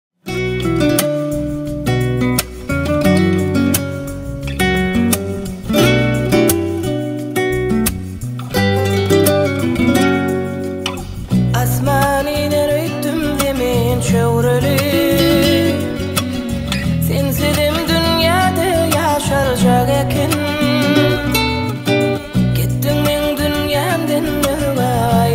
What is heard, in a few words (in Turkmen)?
gitar; dayanc berdiyew; turkmenistan turkiya